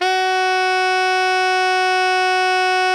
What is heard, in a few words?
alto FF F#3, saxophones; Greytsounds; Philip Wolfe David Crozier's Saxophones